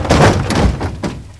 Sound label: ROLL; mtm2demo; PC Gamer 4.2